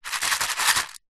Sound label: stones very small in tin shake; zapsplat